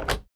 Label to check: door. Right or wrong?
Right